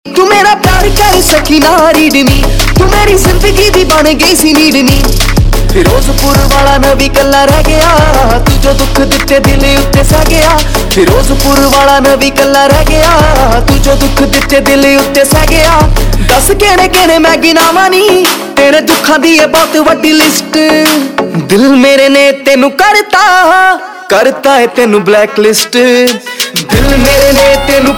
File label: Whistle Ringtone, Punjabi